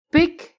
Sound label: pick